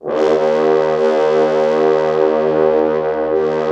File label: Orchestral Brass